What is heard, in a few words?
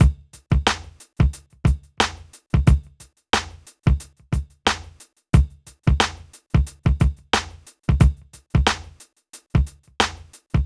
Drums, Hip Hop